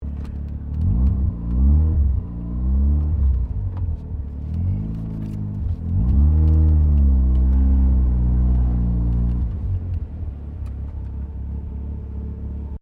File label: CAR MAZDA RX7 SERIES3 INTERIOR REVERSE; Car Mazda Rx7 1983 Series3; Cars (Sound Effects:Vehicles)